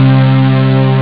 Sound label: bass string